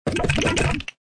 avalanche; magic maid cafe

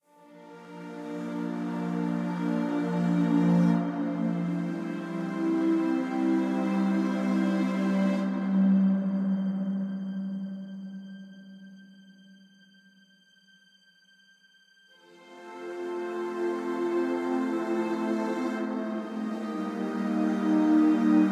ambience Music; temple of nudges

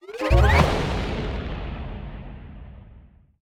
music big win intro; reef raider

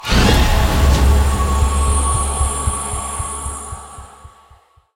respin Win

